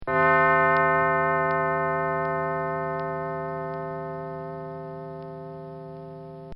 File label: Church Bell Tolling; Churches